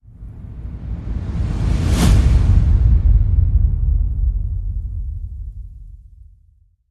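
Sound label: crescendo; Fake Out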